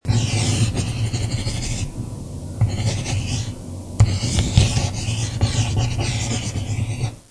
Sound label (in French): crayon; MOBICLIC162